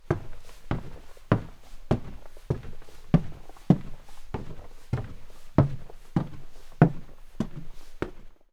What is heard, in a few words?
Walking down sturdy wooden stairs